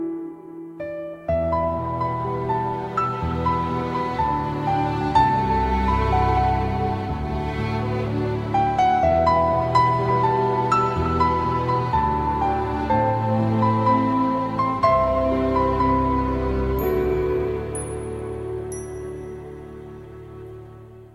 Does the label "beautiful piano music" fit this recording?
Yes